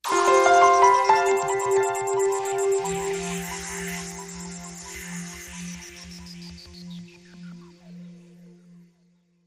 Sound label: Light n Smooth Ident